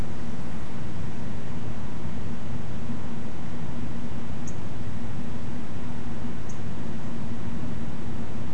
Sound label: Yellow breasted Bunting; nocturnal flight call; Beijing